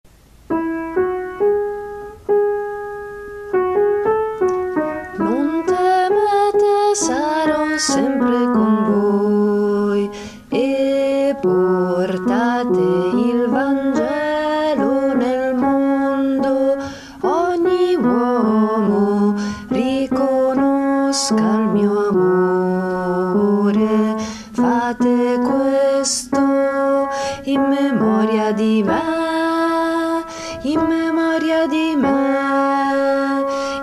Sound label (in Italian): Fate Questo In Memoria Di Me, bassi